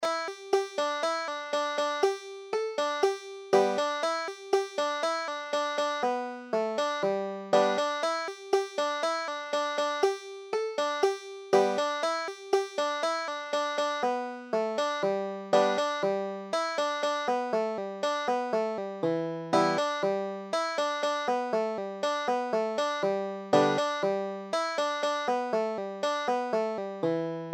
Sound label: greasy meat, banjo